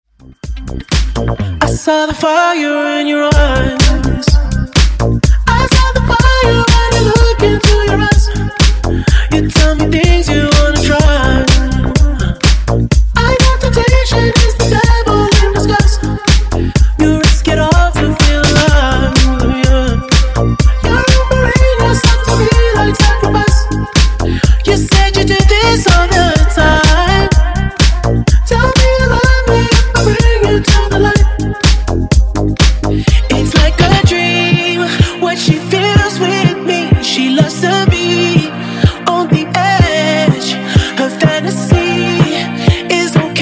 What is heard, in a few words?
Funk Remix Clean 125 Bpm